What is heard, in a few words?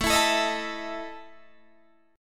E Major 7th Suspended 4th, A